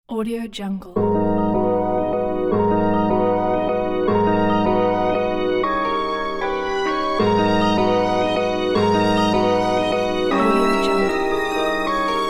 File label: classic hip hop; starmusicproduction